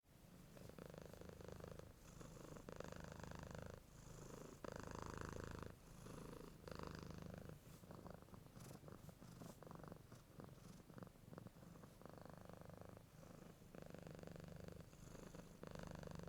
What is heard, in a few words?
cat purr